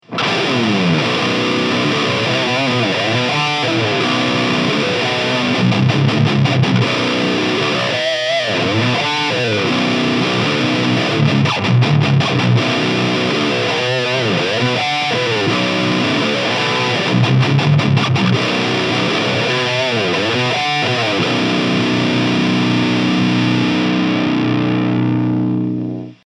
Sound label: High Gain; Solar Guitars T1.7AD Aged Natural